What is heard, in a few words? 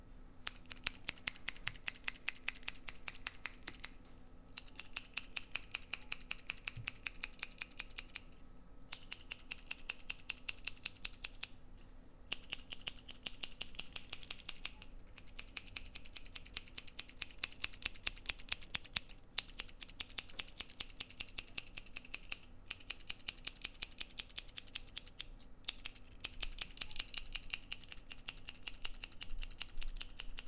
hair cut